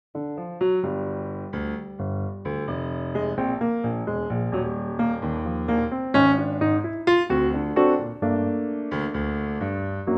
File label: Gm Piano; Pianotrak; key - Gm; range - A to B; Piano only Bossa arrangement of this great old standard